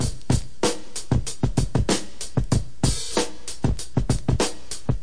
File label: synthetic classic, drum loops and breaks, 96 bpm; 091 to 100 bpm